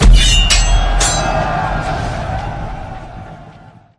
World Attack

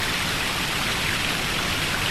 sound Water Pipe

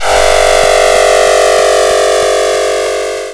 saw sound

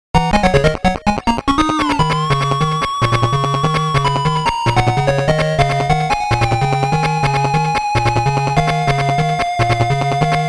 Round Clear; Darius 30th Anniversary Box

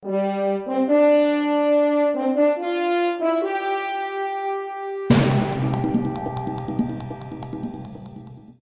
News Tune; Jydge

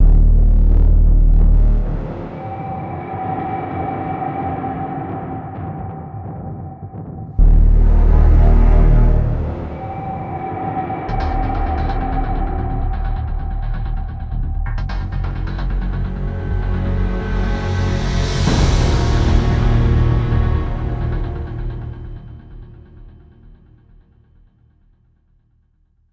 Suspense Oneshot

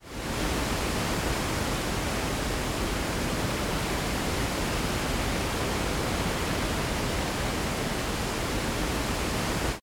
Effect - Waterfall; pokemon mystery dungeon rescue team dx

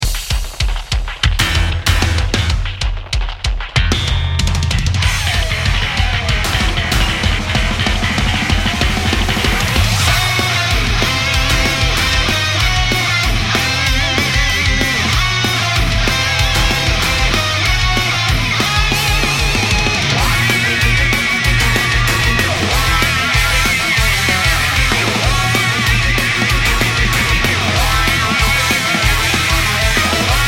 melee

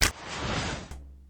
Attack Fly; Endless World Idle RPG

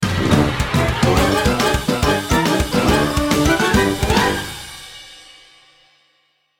Menu Tally Success

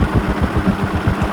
ceiling fan fast

